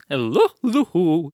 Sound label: Attack Vocals